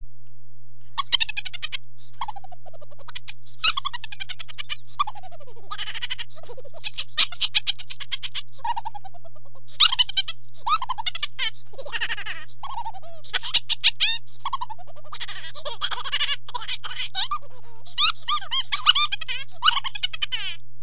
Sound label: laughing chipmunks funny ringtone